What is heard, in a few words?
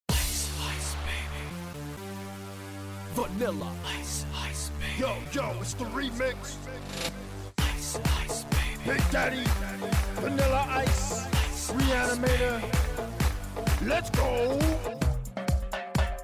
english song tone ringtone